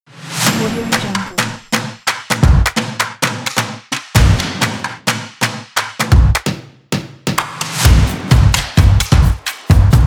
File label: energy music kit